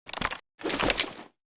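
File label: ak47 gp25 grenade lift, foley; gmod; viper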